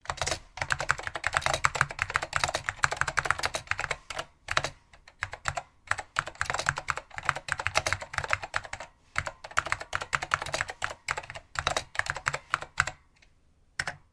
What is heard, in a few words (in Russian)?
pechatat na standartnoj klaviature apple